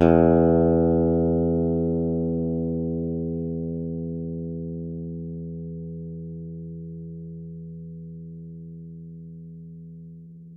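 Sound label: Yamaha pick E 2, Bass; Masterbits CLIMAX 7; PATITUCCI BS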